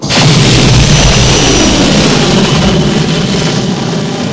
millenium falcon

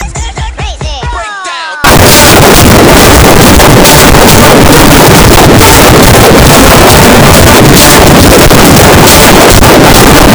eeeeaaaarrrrrrrraaaappppeeee

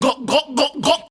Gulp Gulp Gulp

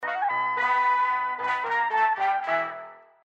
tv tune

